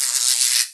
tesla electrocute; edgar

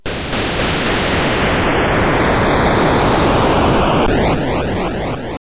game over; missile command

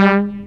horn stop; rigs of rods

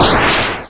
block explode; project hawk

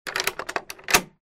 locked; project hawk

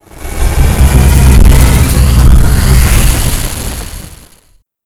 jump drive; endless sky

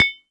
grenade bounce

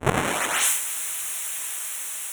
waterspout; project hawk

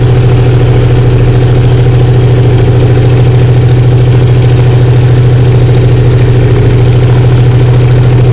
piston prop hipower; rigs of rods

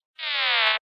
hippy enter; project hawk

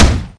sub, weapon

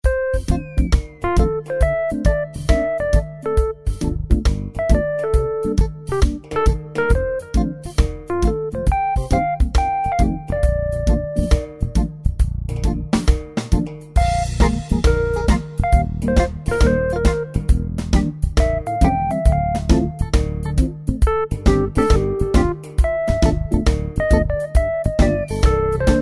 music logo

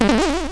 bounce; zsdx

